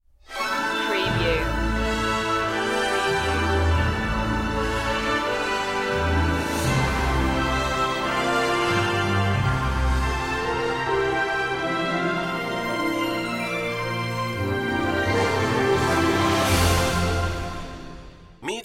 fanfare no choir

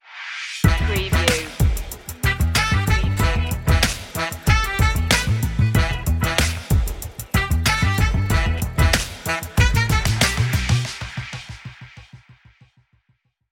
dope sax stinger

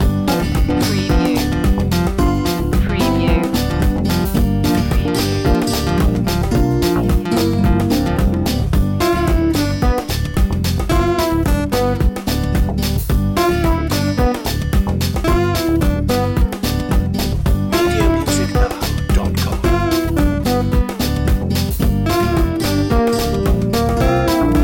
electro strum

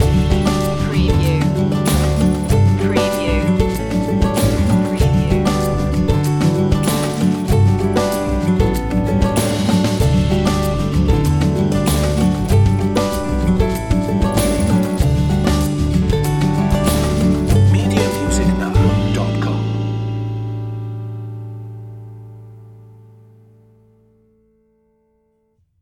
think casual

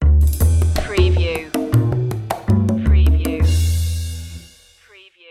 jazzy opener stinger

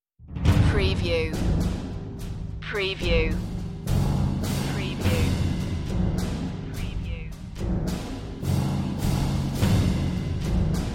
prepare for the horde percussion

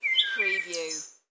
Bird Wood Thrush; sound-effects-bible

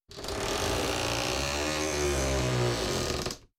Shower Door Creak; Household Sounds / Bathroom Sounds

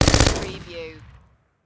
Machine Gun HK416

